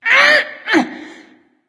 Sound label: grunt lift; Smite; athena